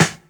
SD, dance drums hip

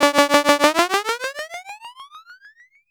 VCS3 FX, EMS VCS-3; Drums

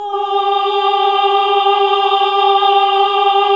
OHH G, Nuns Ooohh; Sound & Vision - Gigapack II

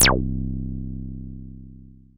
Synths BASS

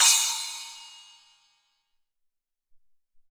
Splash, Alesis SR-18

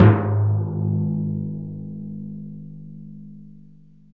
PRC TIMPAN, Timpani Dry; Roland LCDP03 Orchestral Perc

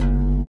LIQUITAR; Spectrasonics; Metamorphosis

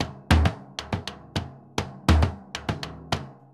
Bombo, Festa Latina, Drums & Percussion; Best Service; Festa Latina VOL-1

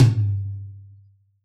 rock tom, Yamaha DJX Rock Kit

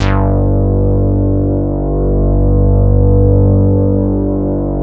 MOOG BASS G2; Best Service Dream Experience

